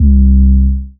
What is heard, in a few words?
Touch 808